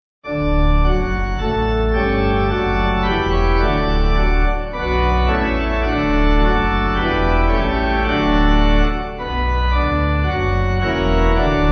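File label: Pipe, Pipe Organ backing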